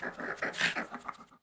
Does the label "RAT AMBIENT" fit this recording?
Yes